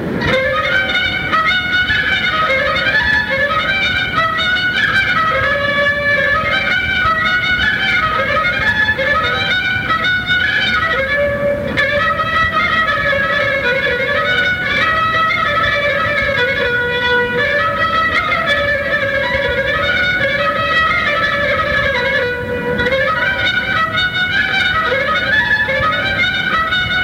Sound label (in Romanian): Hora stanga, joc popular vasluian; Centrul Judetean pentru Conservarea si Promovarea Culturii Traditionale Vaslui